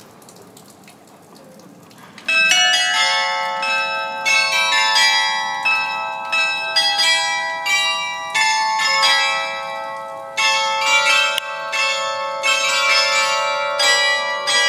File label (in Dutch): carillon stadhuis